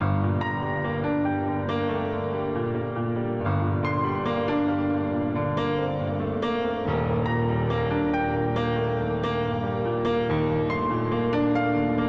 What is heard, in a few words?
piano